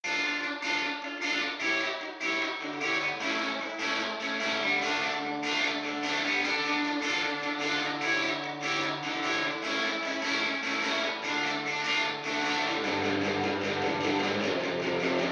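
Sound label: rhythm reverb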